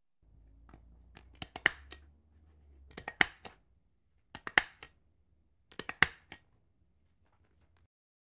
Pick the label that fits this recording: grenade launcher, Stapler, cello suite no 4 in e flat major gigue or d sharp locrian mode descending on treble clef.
Stapler